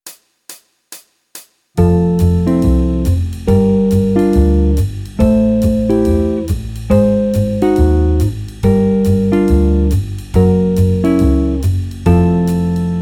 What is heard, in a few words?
Jazz; Guitar Techniques 2019 Issue 296 June